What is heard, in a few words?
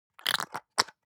object bathroom soap dispenser press squish